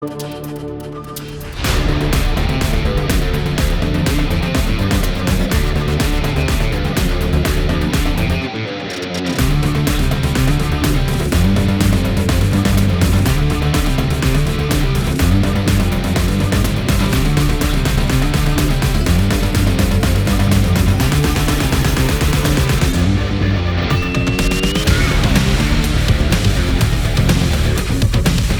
Archery Fight BGM; Master

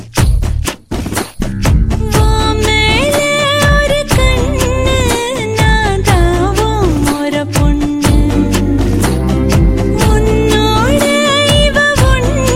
Female Version Ringtone

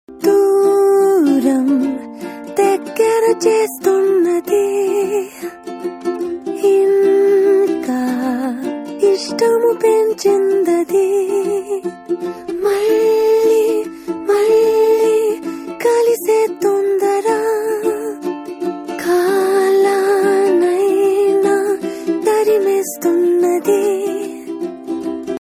Ringtone

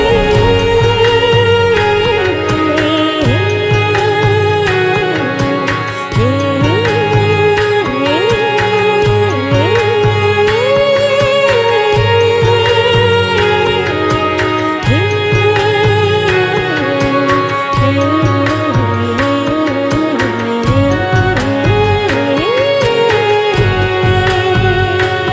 Bg Humming; Shatamanam Bhavati

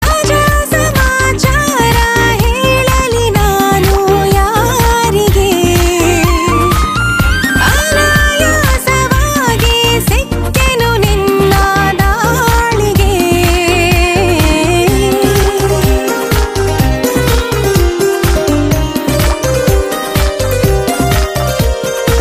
Female Ringtone

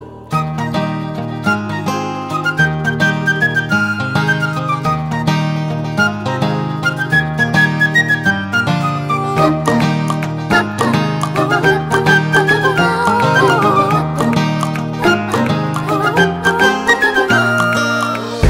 Flute Ringtone

